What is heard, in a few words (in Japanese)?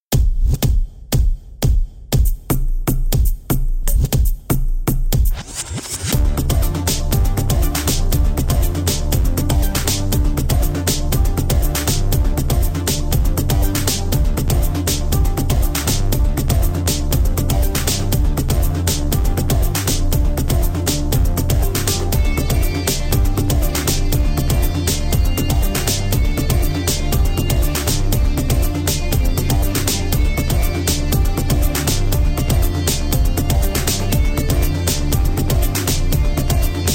ジングル 捜索、交錯 BPM145